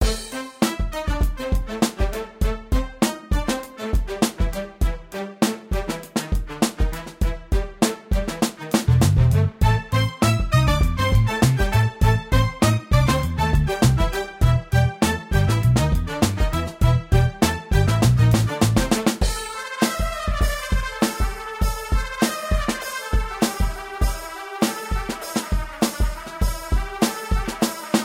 Funk (Waiting)

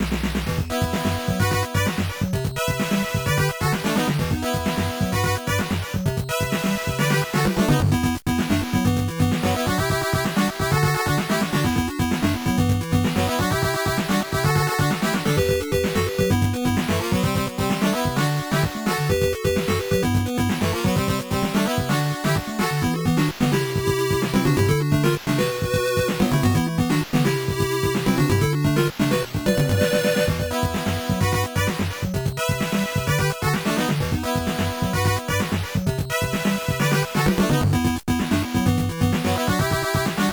Stage 3A BGM; Mono~Stereo

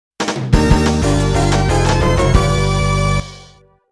Unused Fanfare; Namco Museum; Pac-Man Arrangement; Arcade 1996

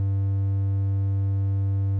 Panel Storage Fuel; Among Us

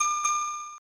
Timer Warning; Panic in Nakayoshi World; snes

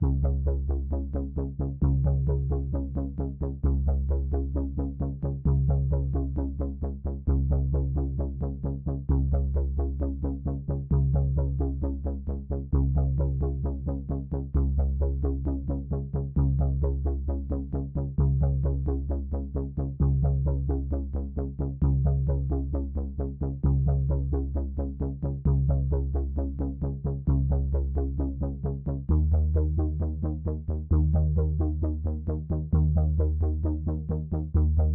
Scrapyard Sword MiniMoog

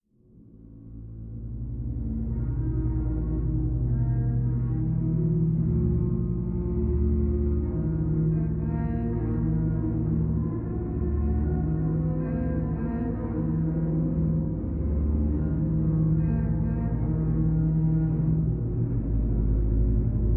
Cave Rhythm Low Strings; Far Cry

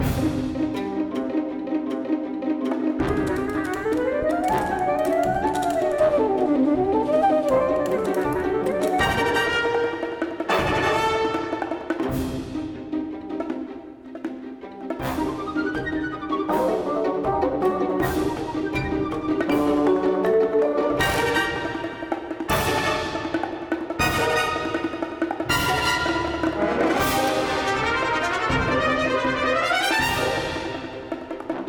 Cinematic Music - Frantic

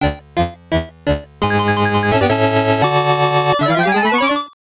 Round Clear

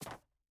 Land Wood

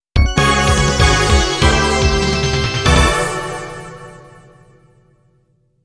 Game Start

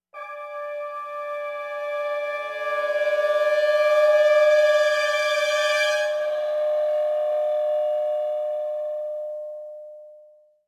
FLOURISH; bolt

